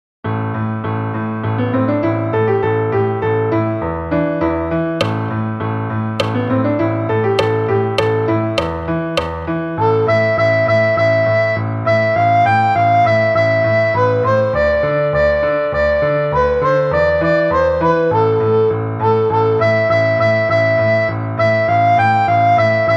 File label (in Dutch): rustig tempo alt; Tureluurs 3